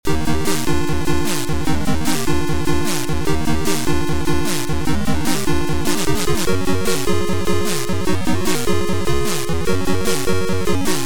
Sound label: anthem NES; X Agon